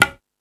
Latin Layers, Drum Machines E-MU Pro-Cussion

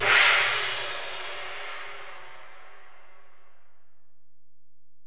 Ride, Alesis DM-PRO

